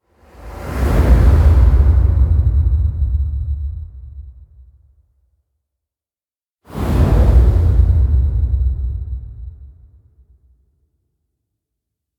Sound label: whoosh airwave